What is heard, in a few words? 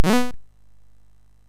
Dragons Den, MOS6581R3